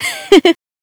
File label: laughter; svencoop; player sounds; lappland